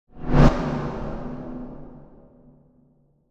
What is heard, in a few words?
transitional swipe; ai interactive comic book